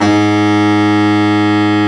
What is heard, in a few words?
BARITON SAX